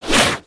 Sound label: Massive Spear Swing